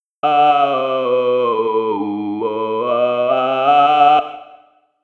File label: tenor